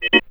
CHORD, Drum & Bass, 130 BPM; Ueberschall; Drum & Bass Resonance

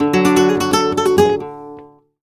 Flamenco Guitar Remates De Pulgar, d

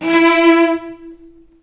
E3, VCS ARCO, String; East West; The Ultimate String Collection

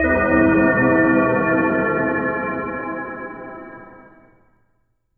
SYNTH BELLS; Zero G; Malice In Wonderland

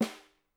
SN, Percussion

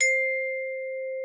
TRIS C5, VIBES; Xsample VOL-4

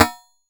CYMB ELEC; Crypton - DrumBase5000

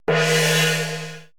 drum c#4, drum computer, 80s drum set; Best Service ProSamples vol.55; Retro Sampler

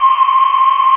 Sonar C5; Digital Sound Factory Xtreme Lead

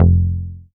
bass F1, Moog Minimoog

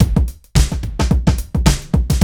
LOOPMATIC, breakbeats; Crypton - DrumBase5000; BREAKBEATS#2

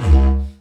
Didgeridoo Kit Samples

